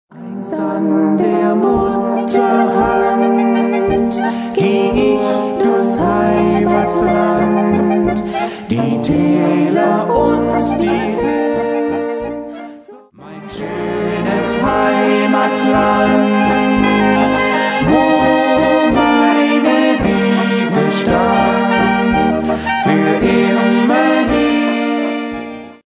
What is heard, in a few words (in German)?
Walzer